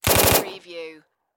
Submachine Gun Thompson

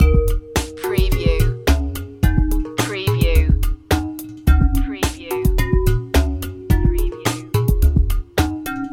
beat loop

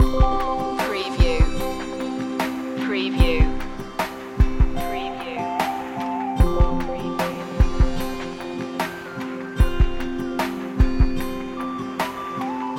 aquatic world loop

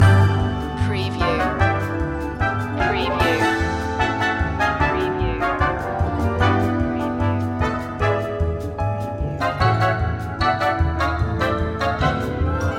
nostalgia tv loop

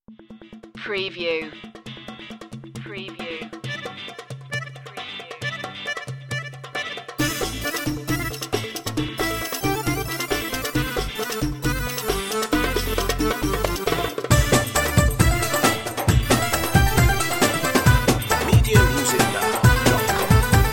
dance party oriental

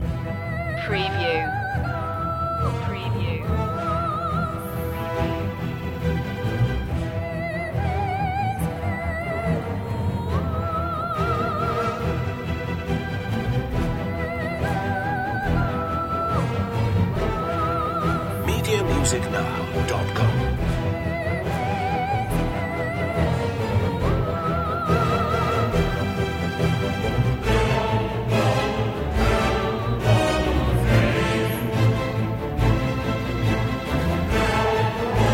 no perc

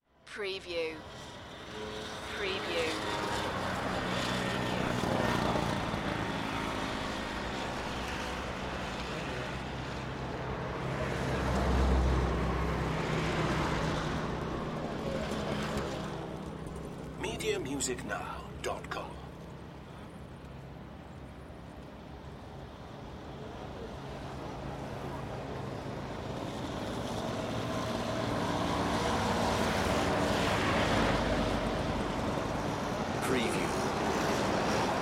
Ouagadougou Street; sound-effects-bible

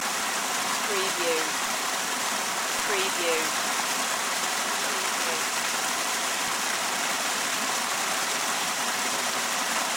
waterfall loop long

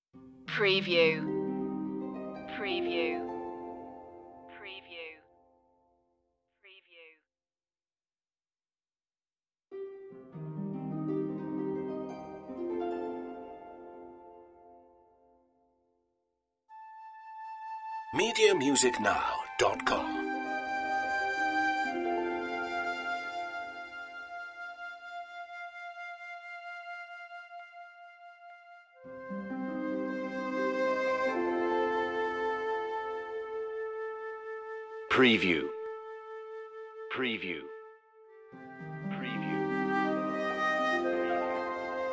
magic forest no strings

